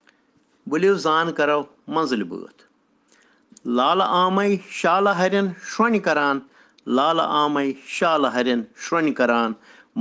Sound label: Children Rhyms in Kashmiri